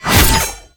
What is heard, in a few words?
Bayonet Melee Combo; Warframe; Tenno